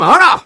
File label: pain sharp